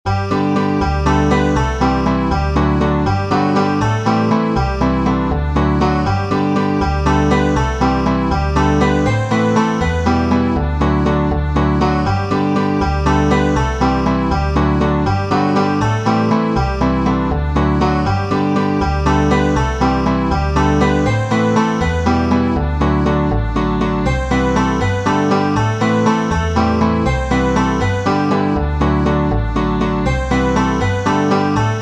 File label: jig, Irish banjo; Jimmy Ward's